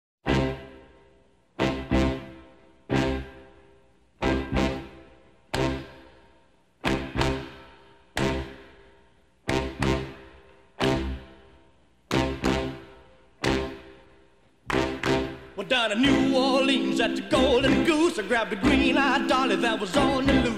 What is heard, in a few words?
Dixieland Rock